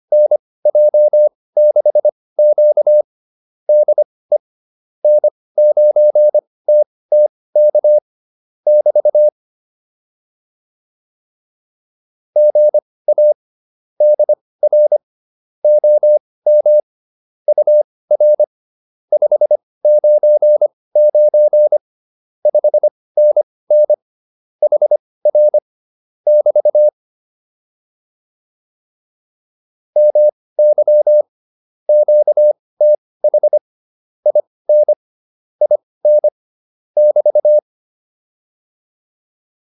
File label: QSO, intermediate practice files